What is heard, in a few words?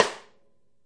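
snare, Real Kit; REAL LIVE KITS; Multisampled Real Kit BJ-HD9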